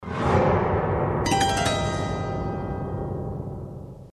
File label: ghostly piano; инструментальные; на смс; без слов; пианино